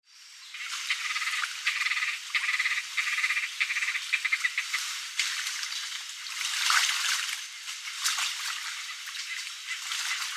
Ein Vogelkonzert am Genfersee; chants doiseaux les grangettes suisse

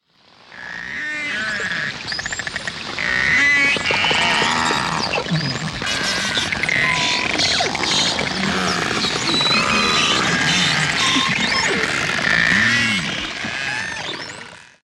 Narwal, walgesaenge; Walstimmen

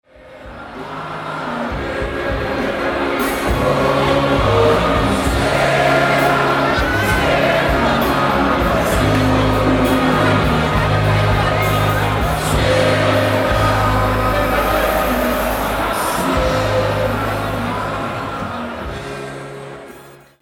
Festzelt, Oktoberfest; oktoberfest munchen; Oktoberfest 2010

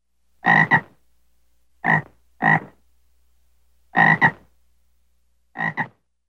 Ochsenfrosch; Tierstimmen; amphibian; animals

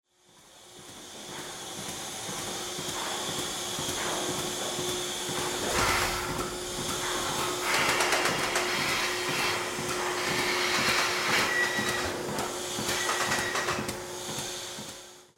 Melkmaschine; Bauernhof; erlebnis bauernhof

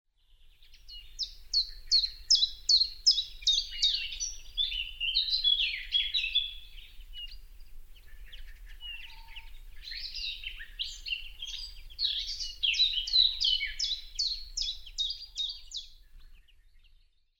Languedoc, Vogelwelt; vogelwelt suden frankreichs

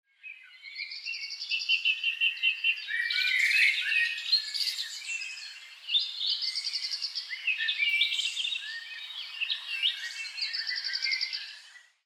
Vogelstimmenkonzert; Garten erwacht

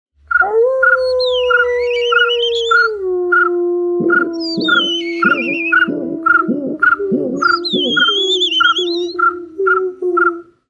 Tierstimmenkonzert

